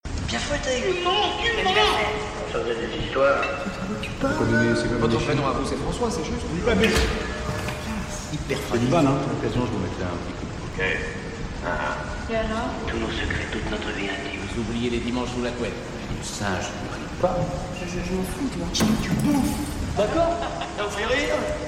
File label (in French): trame defaite